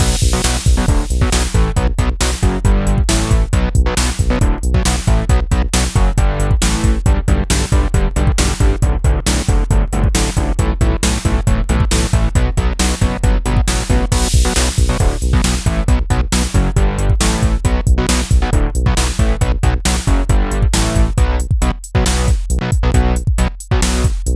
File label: Loop